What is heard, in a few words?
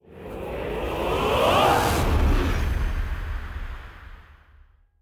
God Shockwave Opener; Huehuecoyotl